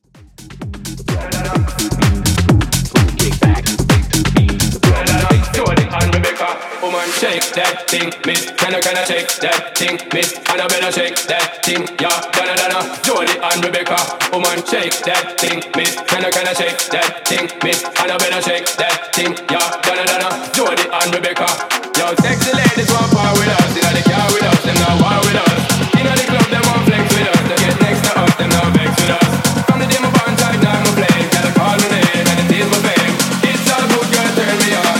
House 128 Bpm

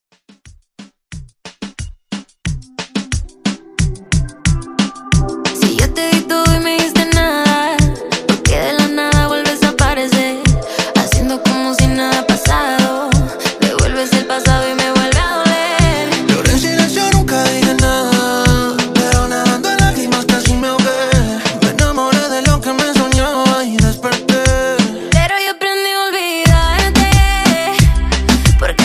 Latin Reggaeton Redrum

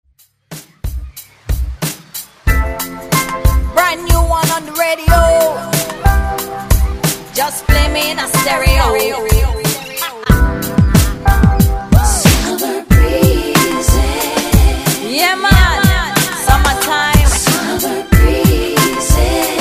Oldschool Redrum

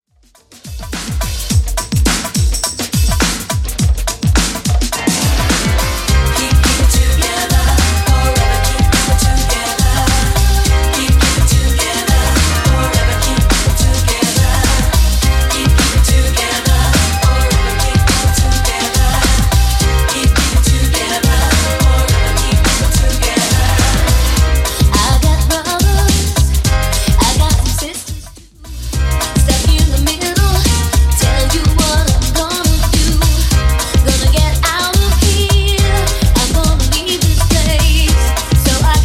90s Redrum 105 bpm

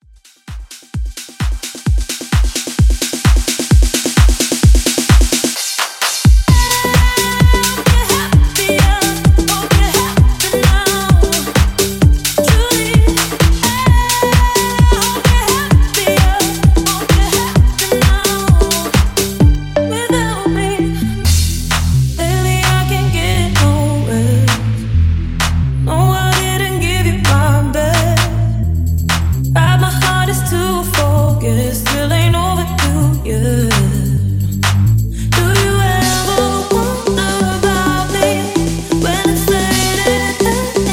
Dance 130 Bpm

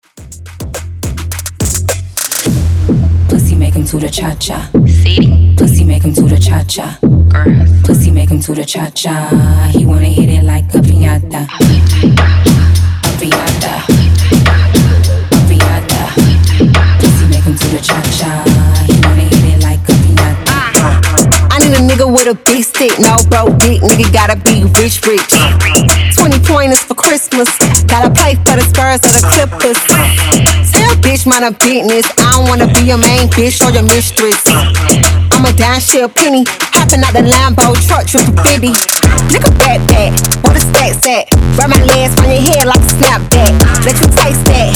Top40 Club Rework 105 BPM

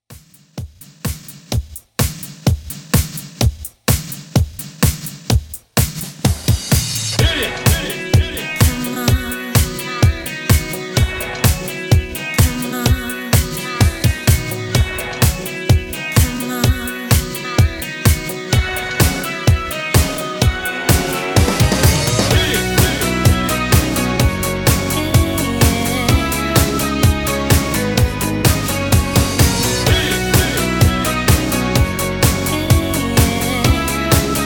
Classic Mix 127 Bpm

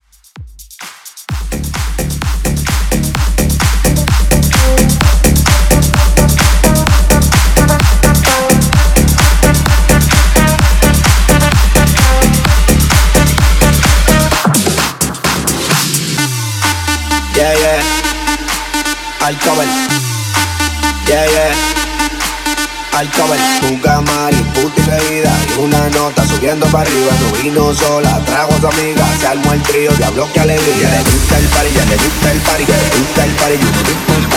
EDM Latino Urbano 129 BPM

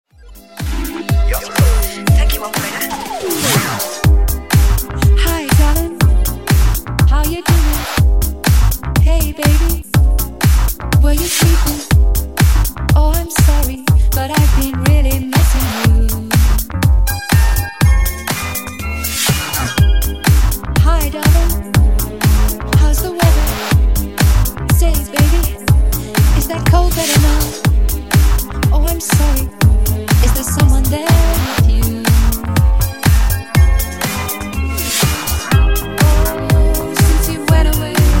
Funk Nu Disco House 122Bpm

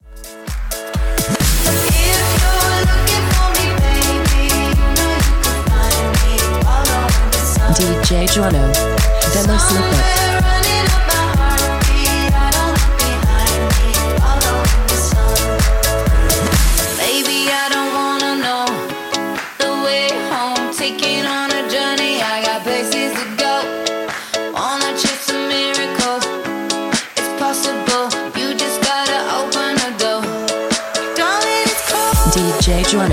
127bpm; REDRUM

